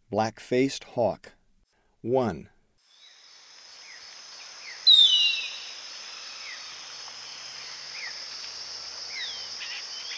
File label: Black-faced Hawk, Ecuador; S. America